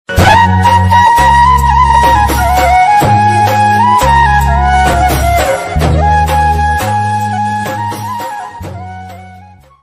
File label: gujarati ringtone